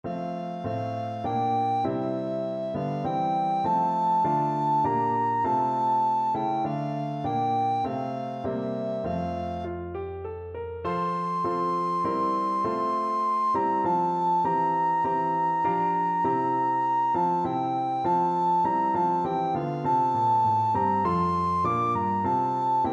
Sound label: god save the queen recorder